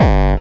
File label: BD Distortion, kicks; VEC1